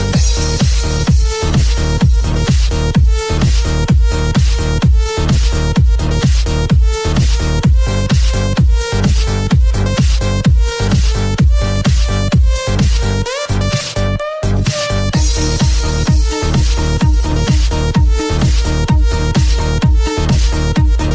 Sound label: Birthday Beat